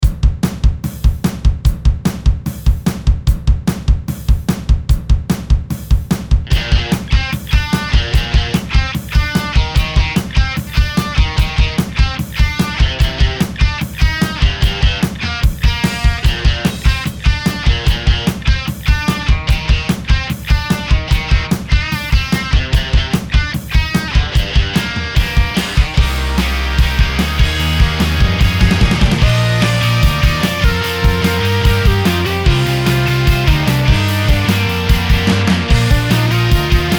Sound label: Medium Rock Song